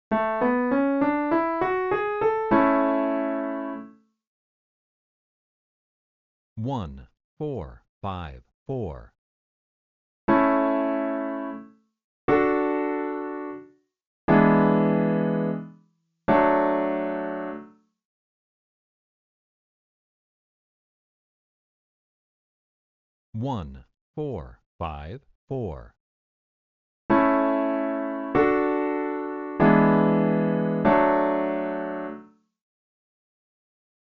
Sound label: I, IV, V7, progressions